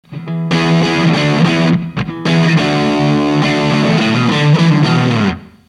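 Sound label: 1458, HARD, LM1458N; なかなか良いのですが / どことなく優等生的と言うか / 癖が無いと言うか・・・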